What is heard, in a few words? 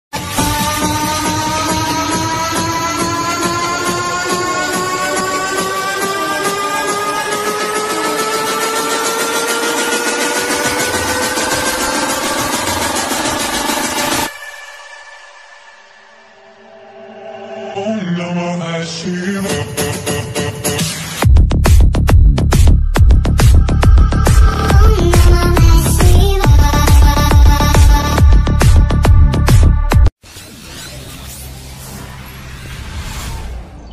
bass boosted music mix subwoofer boosted bgm electro bass bass trance